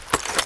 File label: holster